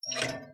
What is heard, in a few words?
Squeaky click